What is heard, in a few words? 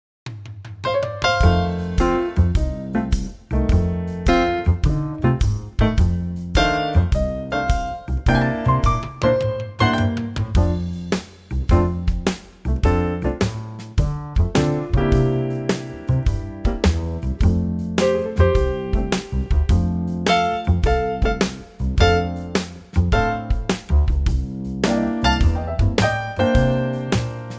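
trio F G flat G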